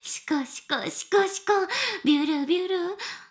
しこしこ音声; 牧場戦腺; 少尉

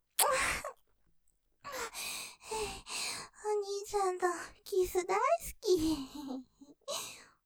キス; お兄ちゃんとのキス大好き; ループで楽しむ！シェフの気まぐれ抜き抜きコース

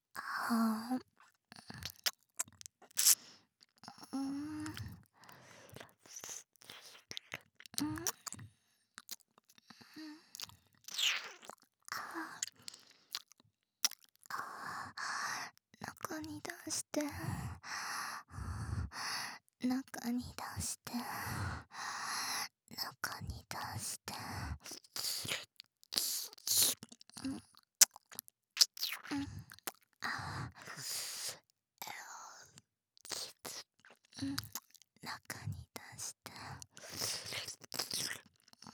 中に出して 耳舐め; DL製作班; ハーレムオナサポ 4人のメイドがご主人様のオナニーをお手伝いするバイノーラル

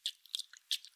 くちゅ 中, くちゅっ 短め

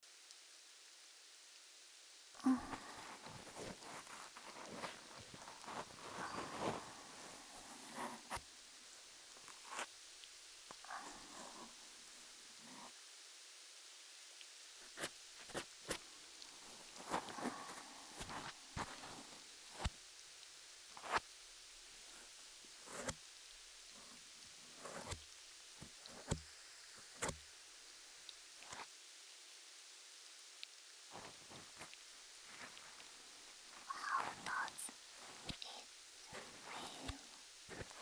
左耳かき・BGMなし; 英語版; 灰色の空と泥まみれの翼

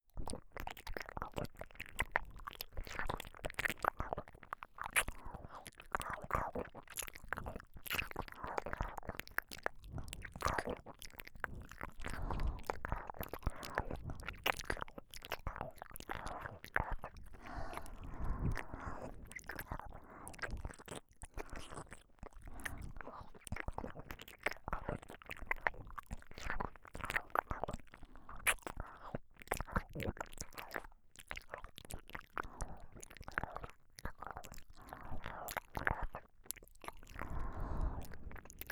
耳甘噛み

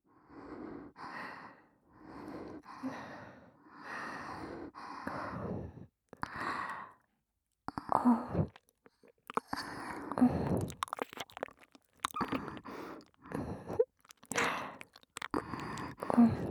両耳舐め; あきほ＋先生

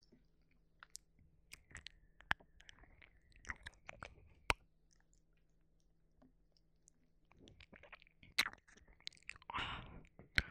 幼馴染耳舐め

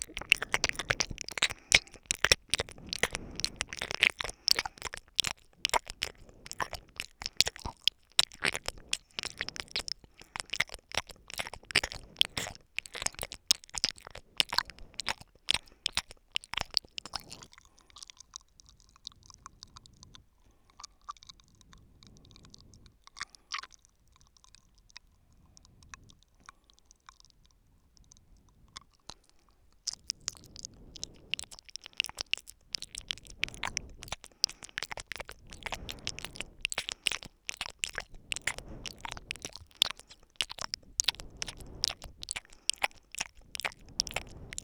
左耳 深め

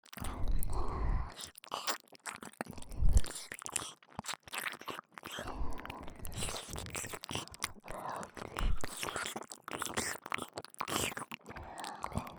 耳舐めループ,ASMR; 双子

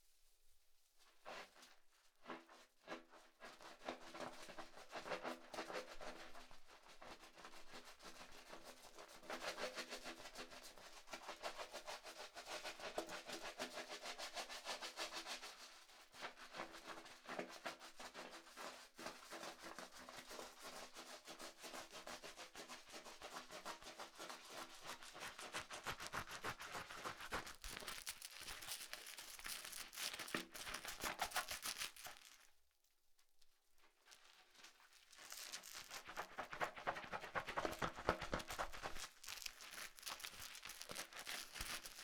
シャンプーをする; 3DIO; お風呂に関する音

